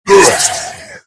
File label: lizard hit